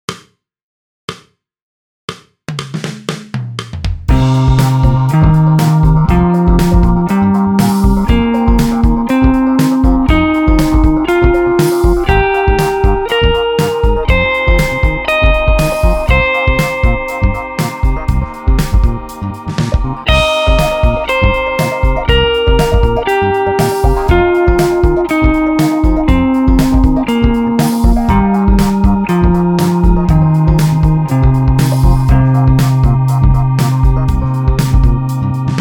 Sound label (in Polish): impro gitara